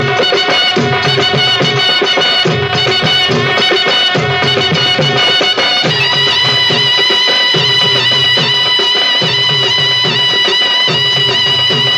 Indian Music

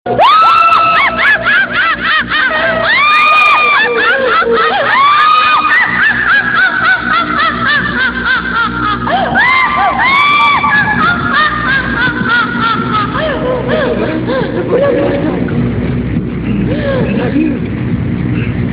Horror Lady Tone

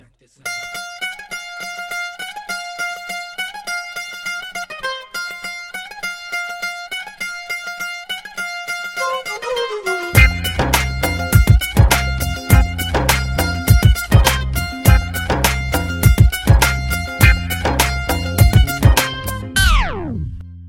Bhangra

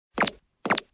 Chopper Walk

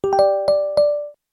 Reminder Active

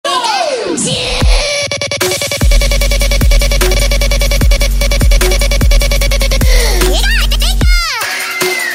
Trap Remix; Pikachu

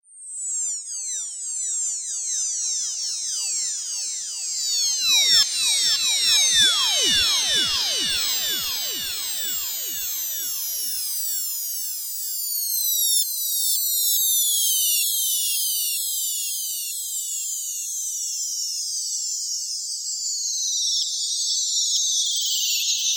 Space Sounds

